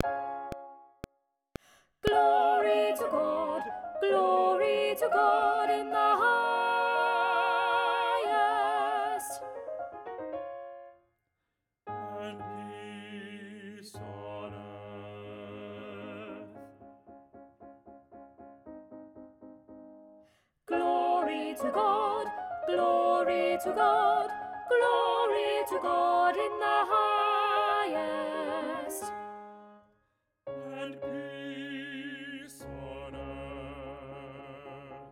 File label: Glory To God ALTO